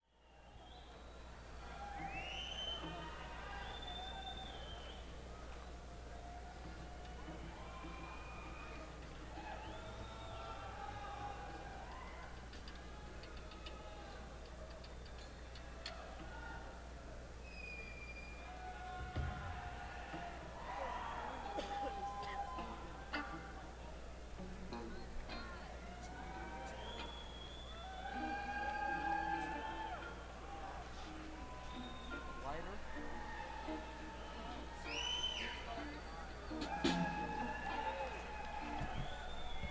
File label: Tuning